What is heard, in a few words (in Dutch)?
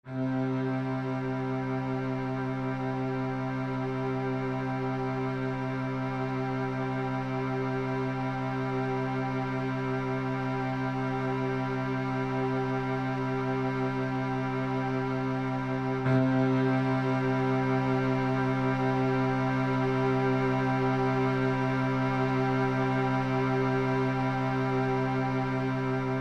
Bourdon in c mineur d mineur voor klarinet